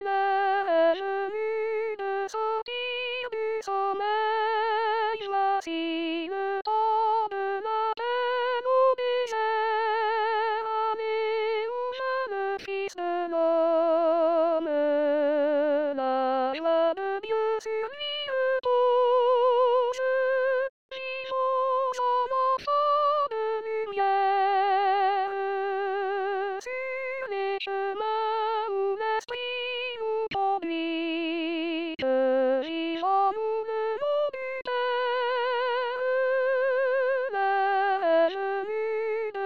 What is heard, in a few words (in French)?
soprano